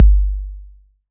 808 bass drum tape; Goldbaby Tape808 & Tape909; The Tape 808

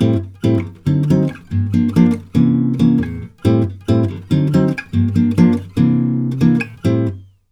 CHORDS, Brazil Bossa; USB Soundscan vol.16

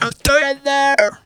"lala", Funky Vocals; USB Soundscan vol.18; TB MELODY

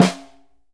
SNR, Synclavier Percussion 2 - World & Orchestral

